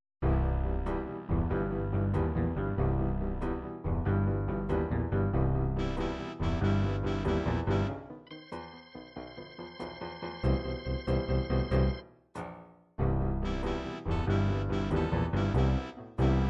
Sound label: 6 8 blues, midi